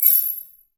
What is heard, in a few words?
passive warning; LoL; evelynn